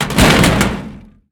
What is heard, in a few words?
riffle drop metal thin; STALKER; IWP